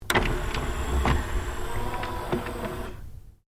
Stereo Akai Mini CD Drawer Open, Multi function music player